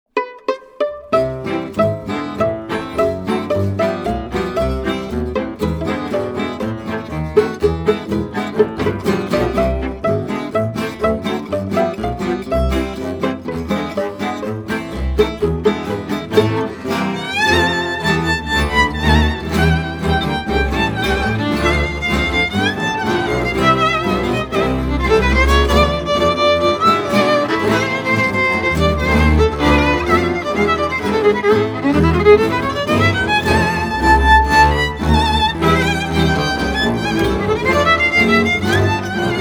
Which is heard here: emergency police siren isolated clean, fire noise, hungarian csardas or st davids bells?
hungarian csardas